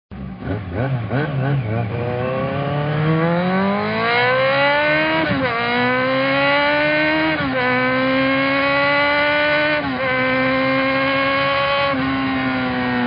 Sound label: fzr1000, moto